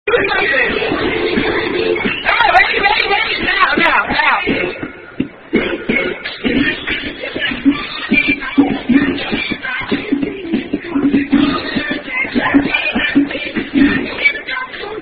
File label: Clapping With Played Music